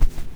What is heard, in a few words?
step; female pianosaur; critters